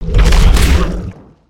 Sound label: punce, creatures organic